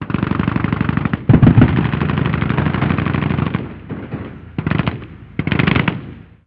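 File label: gun us assault medium; ModernWarfare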